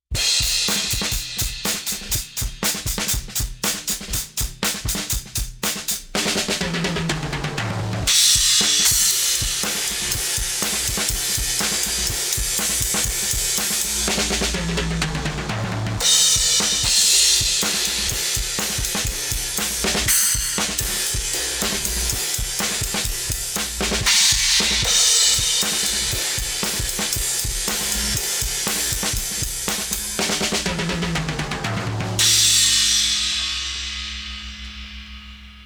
TAPE EQ Low Cut; TAUPE

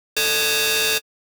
Wavetable Saw MODULATOR; Pigments

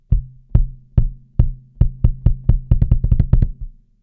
Kick in

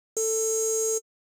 Wavetable; Pigments; Basser

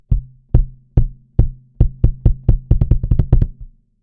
bassdrum PRE

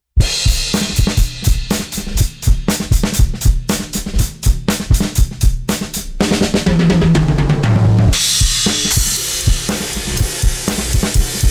Drum; Gainstation

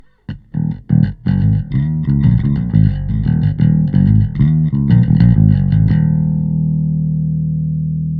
EQ BASS; Pensado

